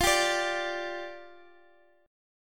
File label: G 5th F, chord; G5/F strummed